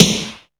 MIX SD; AKAI S6000 CD-ROM - Volume 1

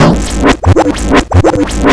grind, EMU XL-7

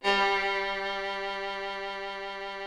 VIOLIN G2; Greytsounds VOL-1

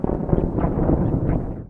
THUNDER; Best Service - XXL Production Effects

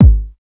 DRMS, Underground Hip Hop

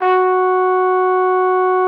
TROP F#4, TRUMPET; Xsample VOL-3

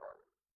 Dog Hats; Yamaha DTXpress-II

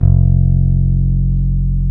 BS BS. GTR, Soul E. Bass; Roland - Rhythm Section

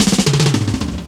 drum rolls; Jungle

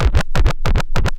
SYNTH SCRATCH, Vinyl Scratches

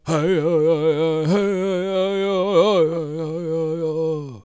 World Voices, CHOCTAW

